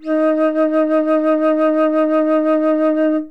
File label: flute